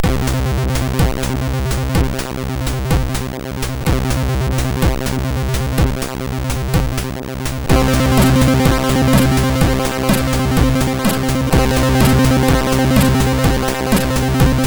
Rythmic MOS6581R3

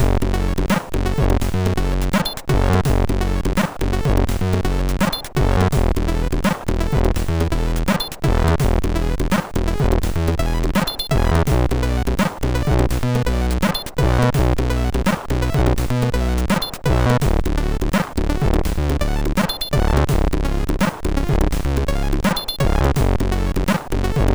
Two-voice Test, MOS6581R3

